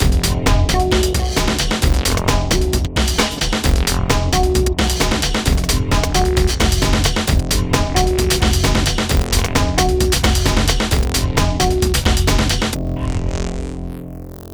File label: Warned, Hardcore Breakbeat, 132 Fmin; Big Fish Audio; Hardcore Breakbeat Action